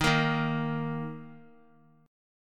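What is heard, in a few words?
E flat 5th E flat, down, Guitar; Eb5 strummed; JGuitar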